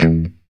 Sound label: POP E2, STRAT guitar; Spectrasonics; Hans Zimmer Guitars VOL-1; JC-STRAT POP